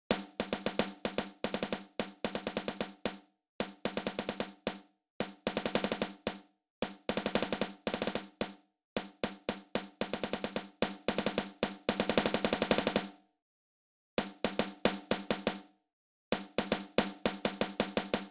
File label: jr snare